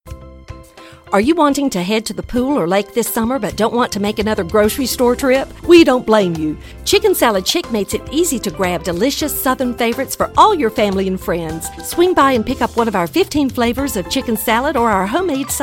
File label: Summer Pool Lake Tropical Music